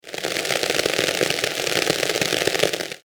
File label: Rain